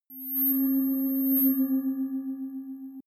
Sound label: ringing bowl; sweeps swells; comedy-cartoon; soundpool